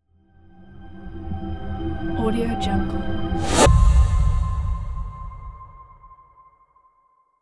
clean logo; legatopromusic

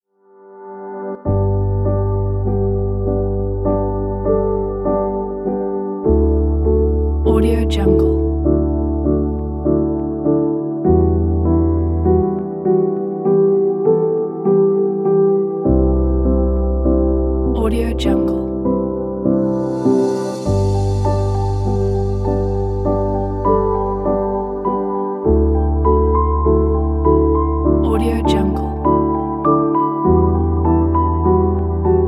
calm emotional piano

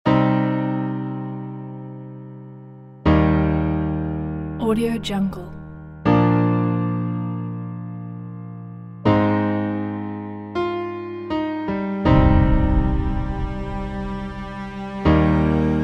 motivational story

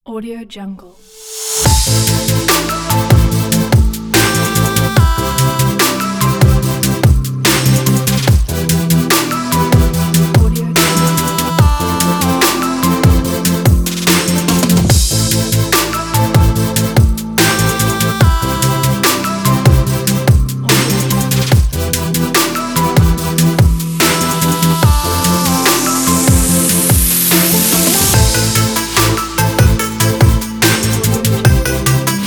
modern podcast vlog; for youtube

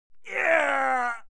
human vocal male yell strained

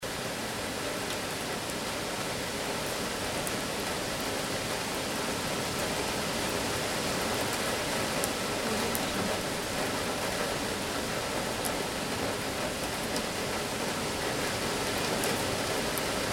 rain on the roof loop

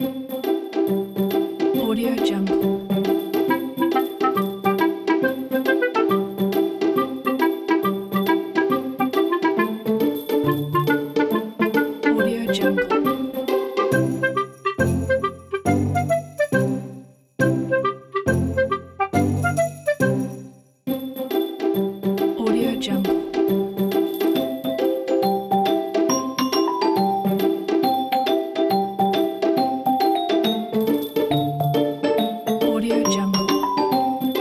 comedy pizzicato; nuclearmetal

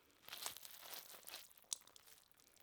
squishy flesh; collanderson

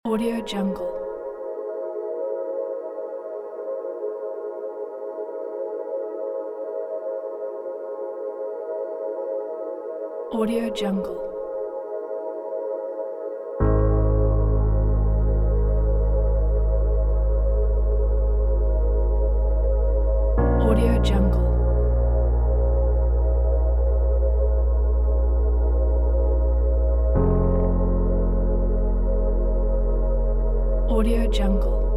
piano soundscape

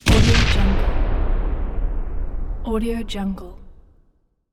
canon gun shot; bogdansfx

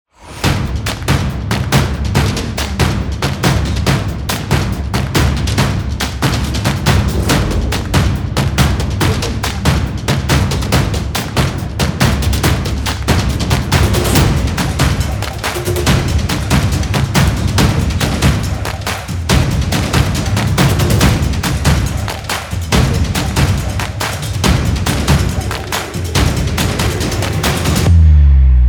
sport beat

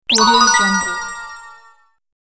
haunted score point

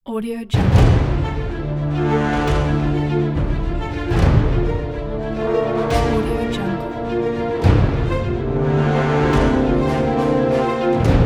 cinematic logo; denaks

